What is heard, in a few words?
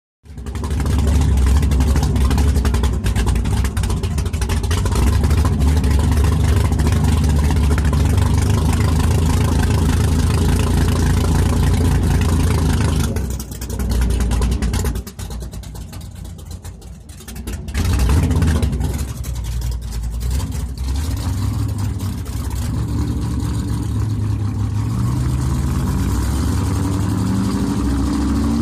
Power Boat Idle; off shore power boat